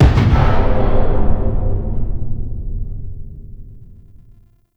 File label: Ram Sled Intrusion Far; RailJack Hazards